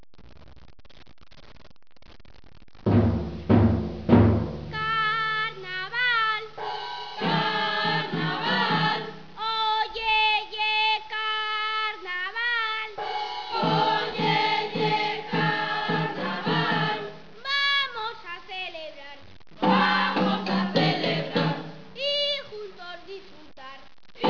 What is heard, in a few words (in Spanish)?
murga